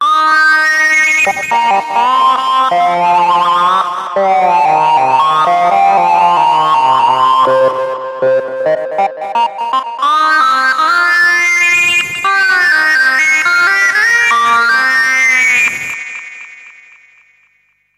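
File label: Sync Lead, Marshall Echohead EH1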